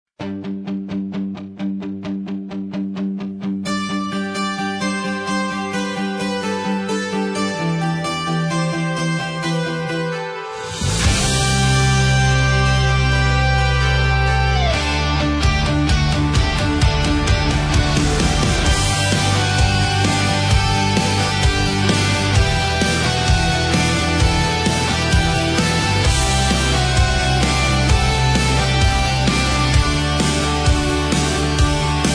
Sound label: Rock Ballad